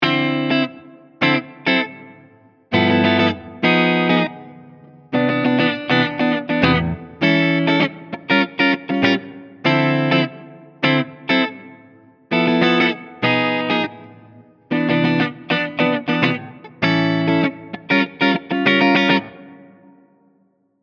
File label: Variax Normal